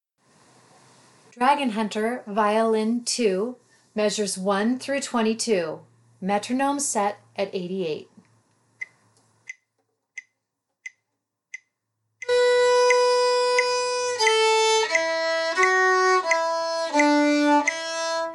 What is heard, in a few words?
violin